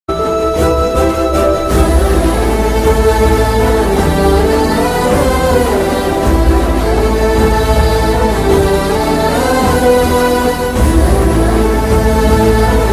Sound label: marriage band sad bgm; ambajipeta